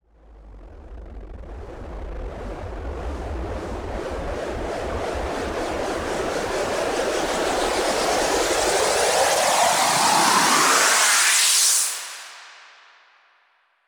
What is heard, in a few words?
fx uplifter, Key D#, Bpm 128, Big Room House; Loop Nerds; Big Room House Allstarz